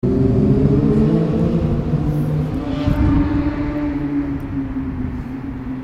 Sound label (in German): motorradlaerm zu hoeren zwischen den rampen; gruppe rot